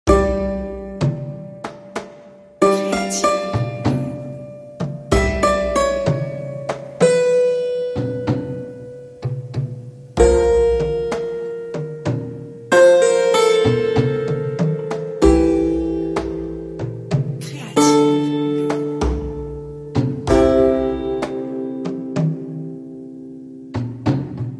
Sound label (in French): Irish Dilemma cordes martelées